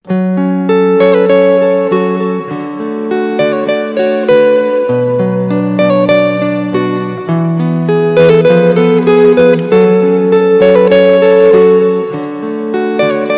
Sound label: GUITAR RINGTONE